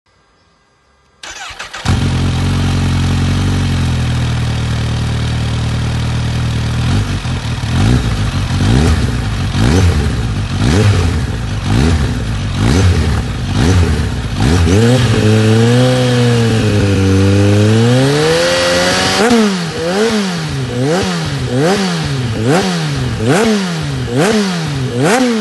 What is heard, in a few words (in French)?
Echappements KAWASAKI ZH2; Silencieux d'origine 7,06 kg; 2020; ZH2SE